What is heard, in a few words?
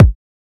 reverb kick sound a sharp key; kick drum samples